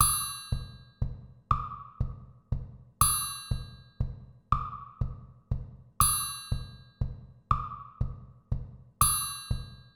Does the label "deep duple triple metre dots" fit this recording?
Yes